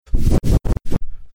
air blasting into microphone

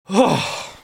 disgusted sound

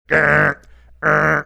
imitating warning alarm sounds

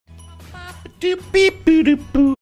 scatting

